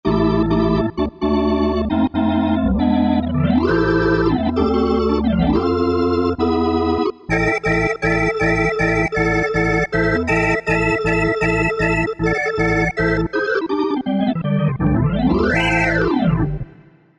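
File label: Rotary B3 Fast; Sonic Reality Modern Songwriter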